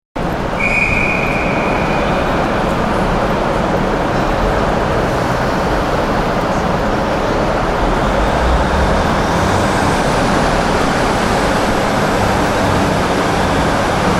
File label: Train Station, Atmospheric ambiance of Liverpool Lime Street station.; Train Stations